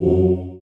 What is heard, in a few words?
chant, note; perdachcraft; minecraft